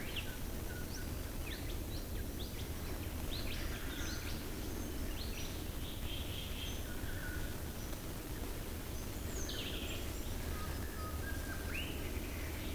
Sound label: Dawn Chorus Recording